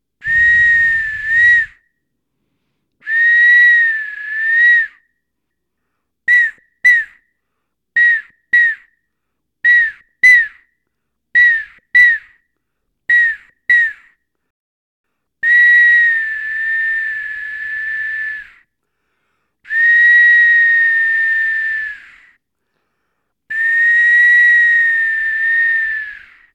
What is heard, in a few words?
solo